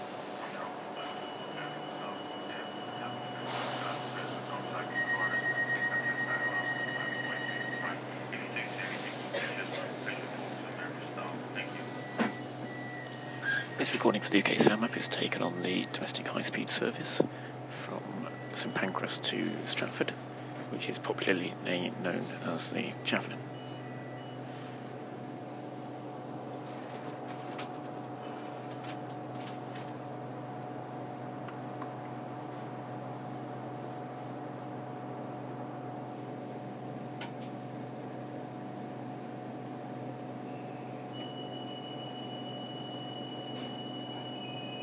on board javelin domestic high speed train; uksm

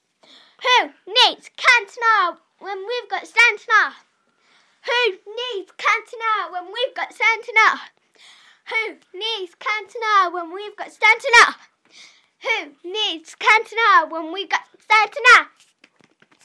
chants from history being passed down

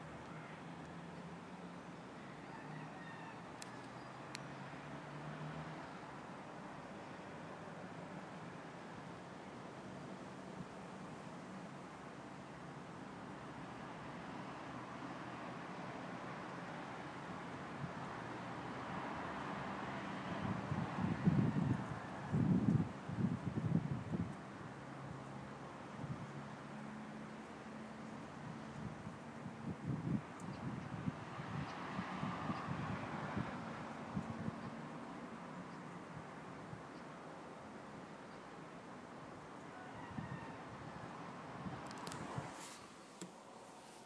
cockerel crowing, crows 3 times; in b13

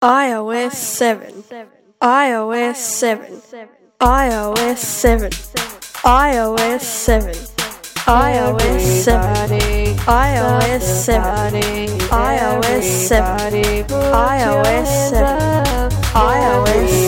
techno; ios7; one of my very first garageband songs

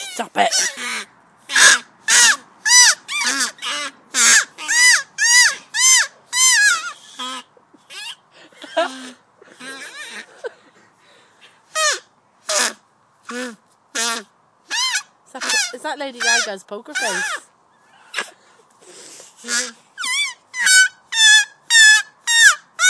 leaf whistling

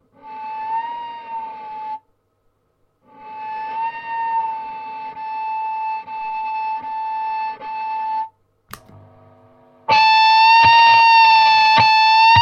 clean boss metal zone

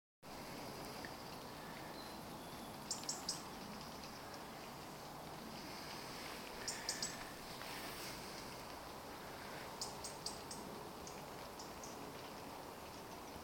robin bird song sounds different in autumn

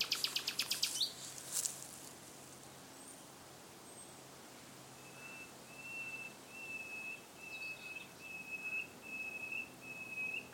nightingale at woods mill nature reserve

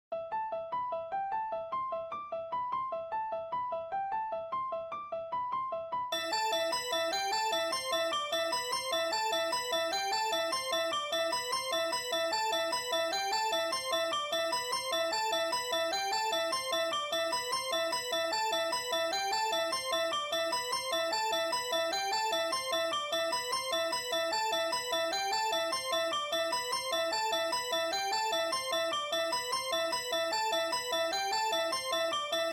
virtual piano flute glocke; realistic; dal flute; syntheway glockenspiel vst

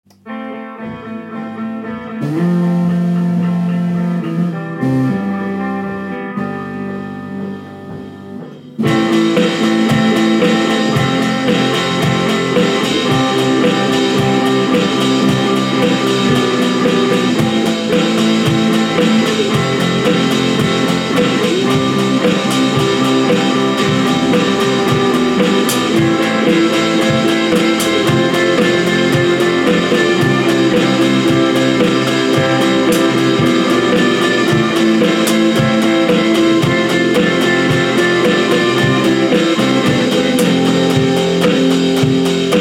drum piece

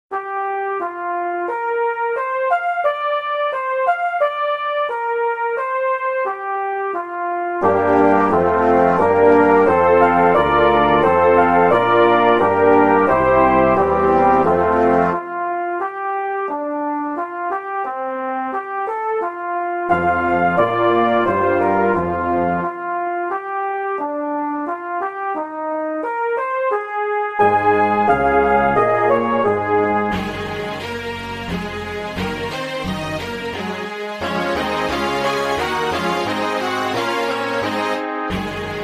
promenade, virtual brass synth; promenade pictures at an exhibition modest mussorgsky; syntheway

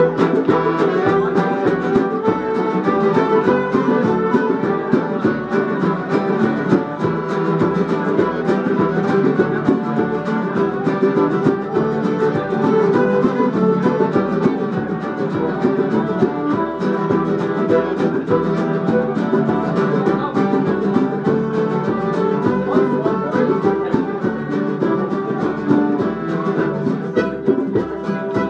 folk music at james street tavern

